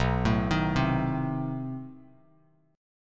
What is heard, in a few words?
B 7th Flat 9th B down, Bass Standard